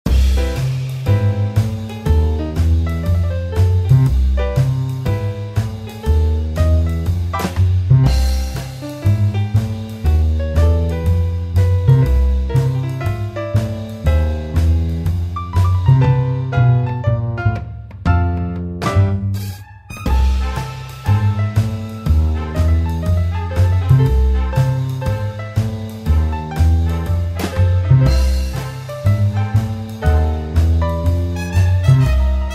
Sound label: jazz loop